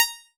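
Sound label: Old Banjo DX100 A sharp 5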